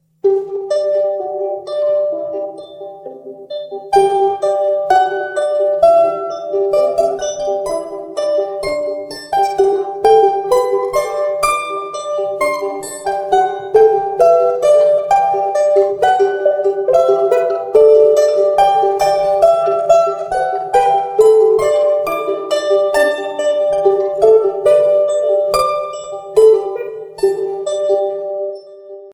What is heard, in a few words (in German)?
mandolinenprobe